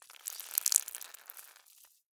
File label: Blood Squeeze Drip